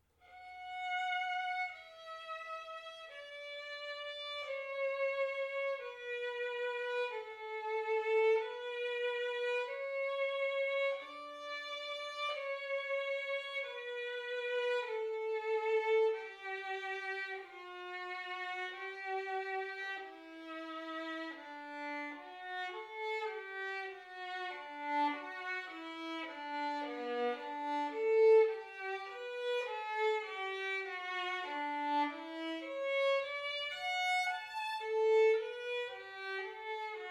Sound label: pachelbel solo; trauerfeiern